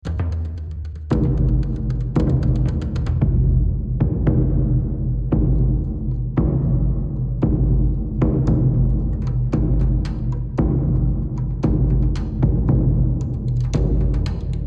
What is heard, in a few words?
Tension High Chunk; The Last of Us